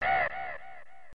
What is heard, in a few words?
necky death; smbx2; Seta's NPC Pack; Neckys